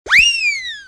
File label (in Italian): fischio